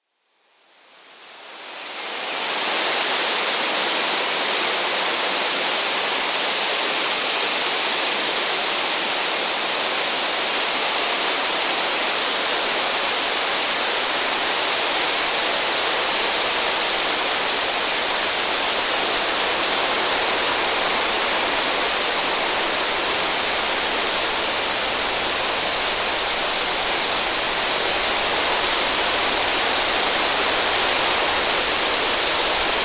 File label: Simple Field Ambience; Monument Studios; Enviornments